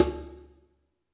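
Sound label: ambience industrial, ethnic percussion; Drums In Your FACE!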